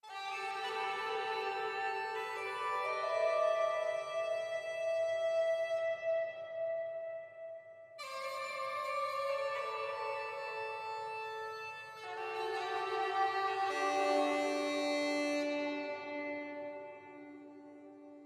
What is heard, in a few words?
Market Front